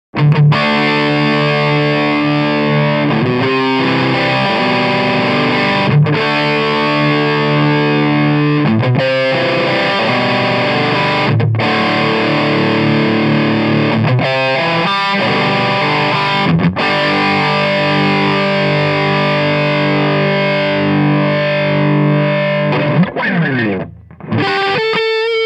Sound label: 6watt el84